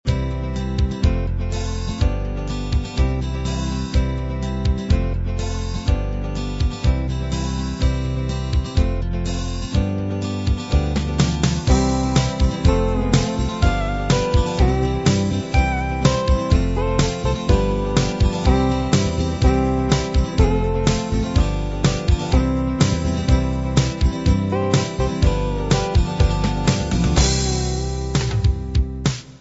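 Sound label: country 8 beat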